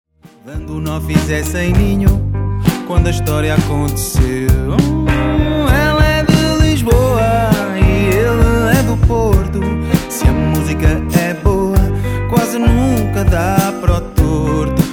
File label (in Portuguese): Guitarrista